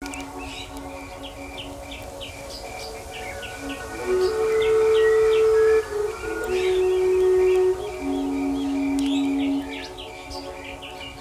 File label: asian tropical birds; harmony nature sounds academy